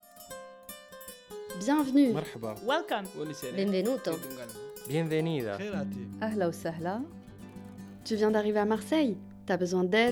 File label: Jingle; Welcome Map Version